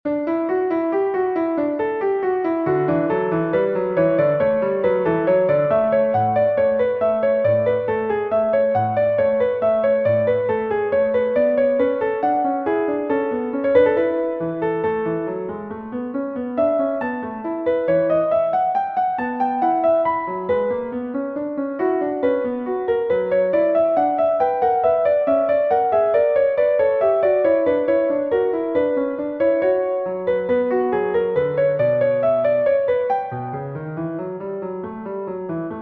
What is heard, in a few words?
invention bwv 774 in d major